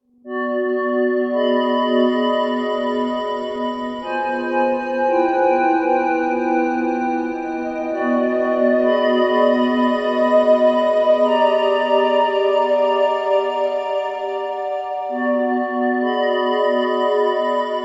Spooky Hour Theme

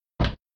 Foley Kick Impact

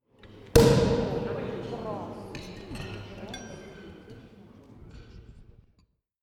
Champagne Boom

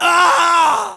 Scream Male

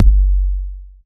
Kick Sub Acid Kick

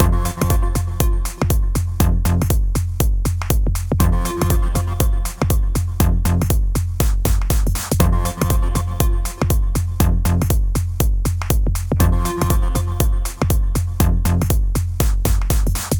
Retro Techno

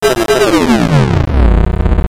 Baby Glitch

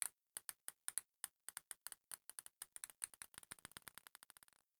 Ping pong double

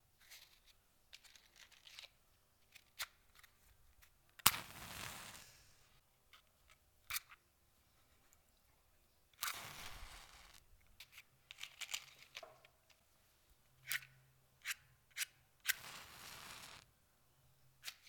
Matches Being Ignited

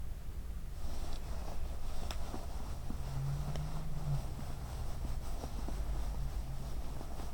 Fabric Rustling

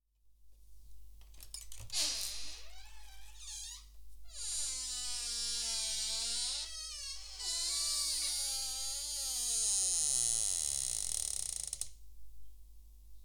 Creaky Door

